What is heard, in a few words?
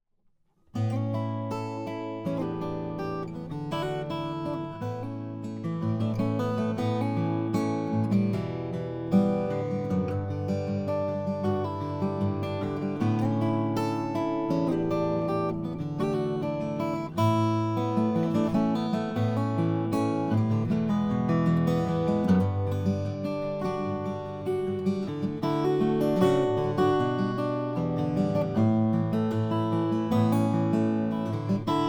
olson sj indian rosewood